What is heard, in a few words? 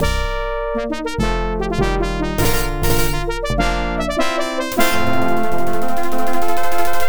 Overall Victory; wing commander ii vengeance of the kilrathi ibm pc at